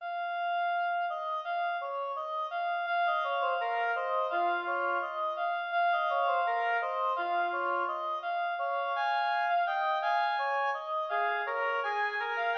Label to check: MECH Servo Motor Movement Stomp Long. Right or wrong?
Wrong